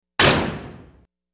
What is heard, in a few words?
Industrial Oven Door